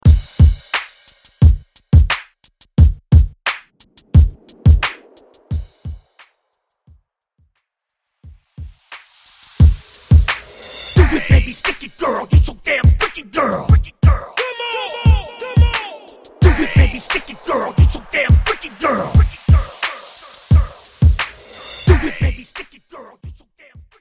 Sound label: 2000s RB Re Drum; Mainstream VIP; Vegas Edit